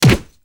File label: punch